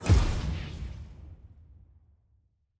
emotes place all; collections

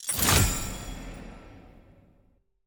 sfx teammate lockin; clash

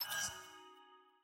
party channel disconnect; premade voice

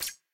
sfx missions locked; tft sf2023

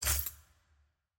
ui main button hoveroff

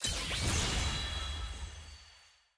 skin click; lux

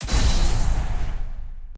launch click

